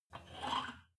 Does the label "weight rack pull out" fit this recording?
Yes